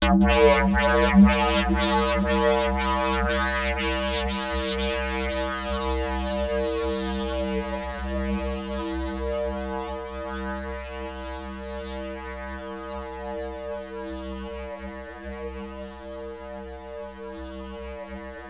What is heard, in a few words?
Access Virus B C3; Synthworx